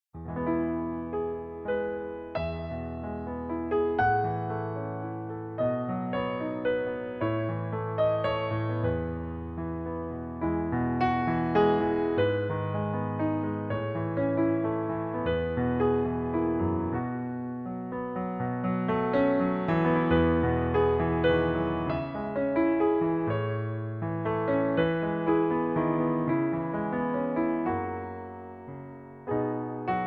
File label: Morning Has Broken Piano Version; Bunessan; Public Domain